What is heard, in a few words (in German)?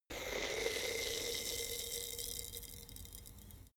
bottle vodka fizz, gastronomie